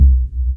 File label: old school low tone kickdrum sound c key